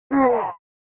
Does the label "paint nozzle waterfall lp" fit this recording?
No